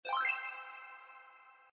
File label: spacedice win